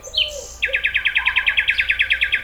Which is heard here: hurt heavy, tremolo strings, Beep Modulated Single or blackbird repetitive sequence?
blackbird repetitive sequence